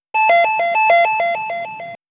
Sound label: wireless call; densetsu; signal